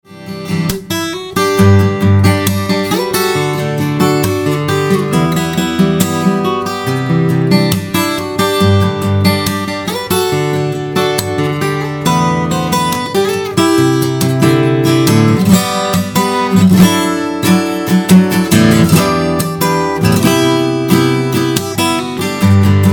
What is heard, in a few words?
Guitar